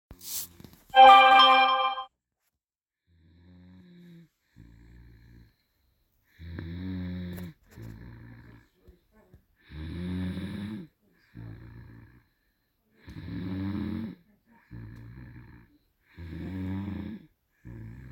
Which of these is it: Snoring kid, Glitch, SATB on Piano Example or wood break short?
Snoring kid